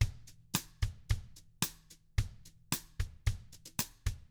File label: 111 COUNTRY; ILIO; Double Platinum Drums 1